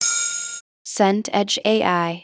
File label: bell attention